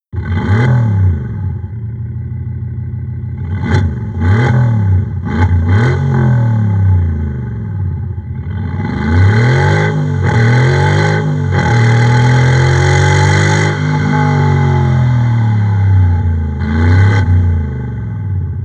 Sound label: Mercedes AMG g63